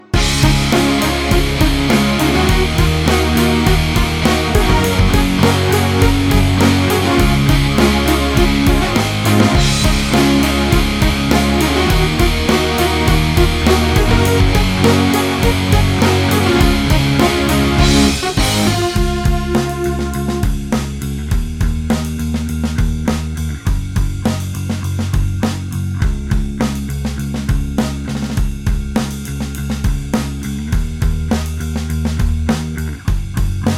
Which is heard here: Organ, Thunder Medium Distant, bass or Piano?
bass